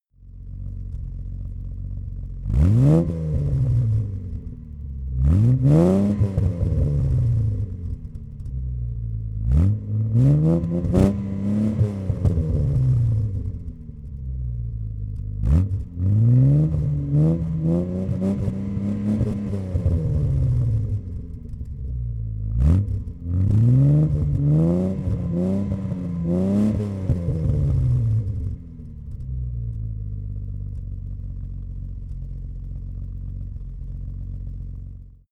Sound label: car jaguar e-type rev exhaust